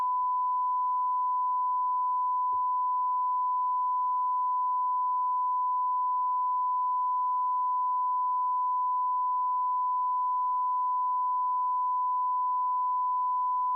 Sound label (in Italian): Calibrazione 94dB; ZoomF6; Trim=+22dB